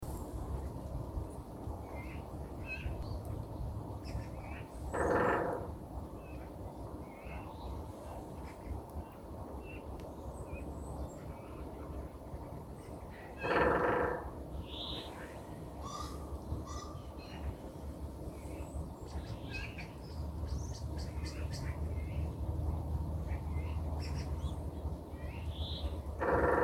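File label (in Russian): в парке дятел внутри сухого дерева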